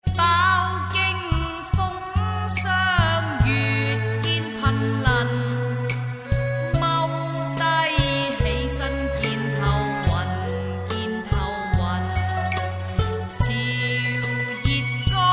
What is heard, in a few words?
ringtone